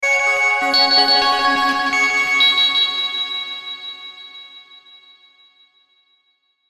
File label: Logo Synth Blips High Ring; Sonic Logo Sound Effects; Production Element Imaging Element Accent Transition